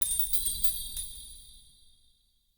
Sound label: Perfect Mote Acquired; Animal Motes